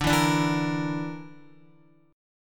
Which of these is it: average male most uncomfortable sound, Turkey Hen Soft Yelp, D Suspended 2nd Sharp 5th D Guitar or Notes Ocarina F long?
D Suspended 2nd Sharp 5th D Guitar